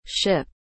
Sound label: ship